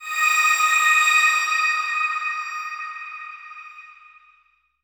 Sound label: Player Level Up; Inferno Climber